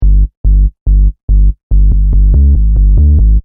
Techno-Trance Sub Bass Loop In G 142 BPM

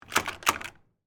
Padlock Shake, Foley, Padlock, Shake, Movement; Twice; Locks (Sound Effects:Objects); BlastWave

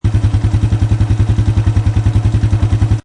Quad Bike Idle Loop Engine Perspective; Motorbike, motorcycle type Quadbike; Motorcycle Sound Effects; Lynne Music

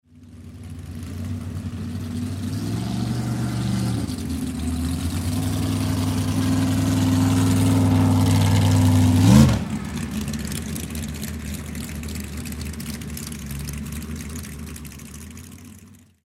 Swamp Buggy Dive Up Idle; Swamp Buggy Drive; Transportation Watercraft

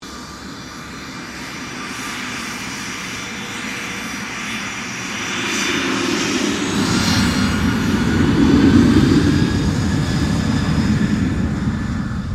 AIRCRAFT CESSNA CITATION CJ3 TAXI; Airplanes; Vehicles

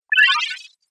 Arcade Game Spell Time; Video Game Sound Effects; Retro Inspired Sound Effects - Think Nintendo, Atari, C64, Etc

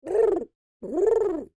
Creature Oodle Bird Calls Reacting; Animals; Birds

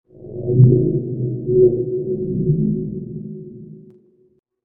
Slowly Through The Force Field, laser sound

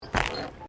Footsteps Boots Suspension Bridge Wood Wire Step; Footstep Foley; Human

